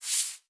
page flip; Loupedeck Sound Pack

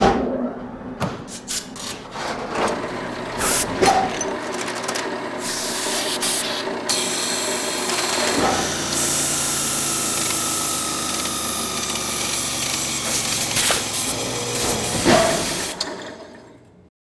Pneumatics

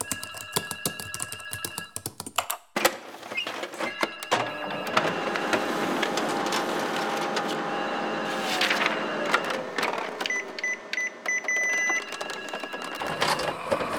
Office Dystopia

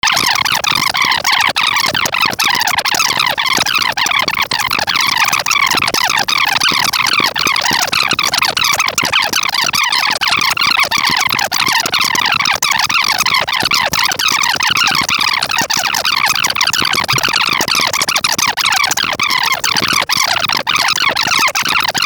Tape Rewind; ultimate vhs pack; Videohive

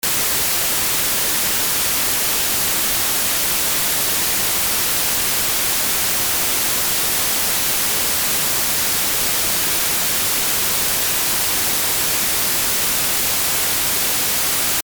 Display No Signal, vhs; ultimate vhs pack; Videohive

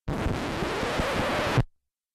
Record Needle Scratch

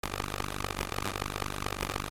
Tape Distortion; ultimate vhs pack; Videohive

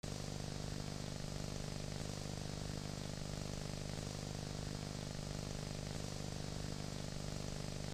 Background Noise; ultimate vhs pack; Videohive

